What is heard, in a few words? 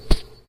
bump